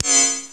nvg turn off; blackops

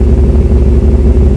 buzzer machine; russian

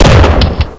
gun cock; weapons; shot; dmc

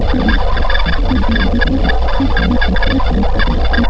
comp bleep; ambience; vendetta

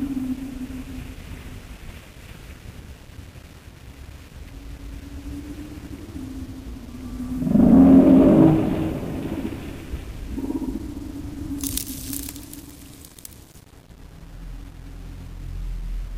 fright

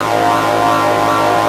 laser active

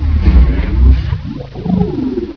panther idle; custom monsters

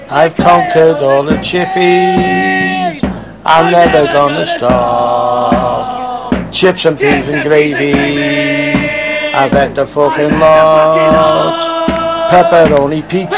norf anthem

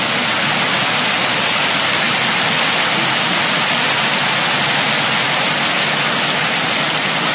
rain; st mission

